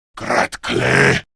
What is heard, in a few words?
Surprised Grineer Male; Warframe; Battle Barks